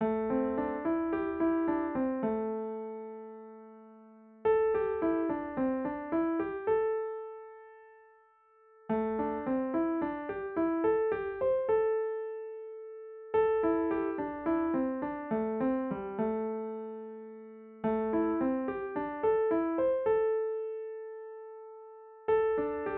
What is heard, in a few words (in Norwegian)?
skalaer pentatonisk moll a moll; elgitar